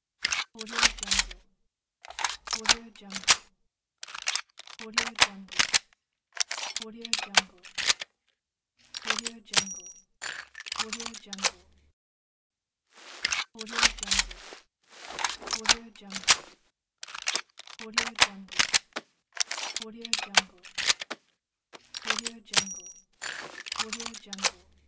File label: pistol reloads